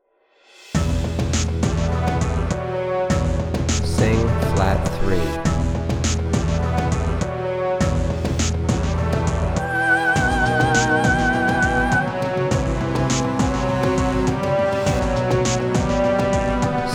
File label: Soprano Key of E; movie score ear training